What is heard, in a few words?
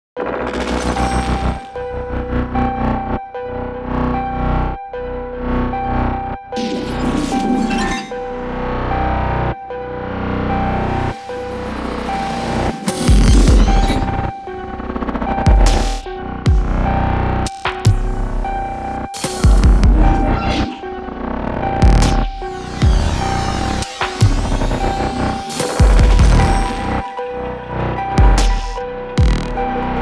error in render engine